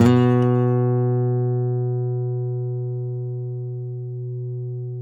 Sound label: NYL B, FLAMENCO NYL; Spectrasonics; Hans Zimmer Guitars VOL-2